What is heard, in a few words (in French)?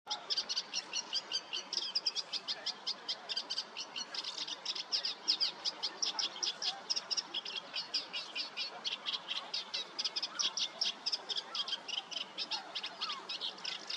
Acrocéphalidés Acrocephalus scirpaceus Rousserolle effarvatte